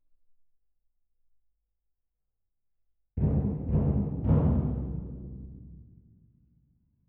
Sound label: Twin R Perc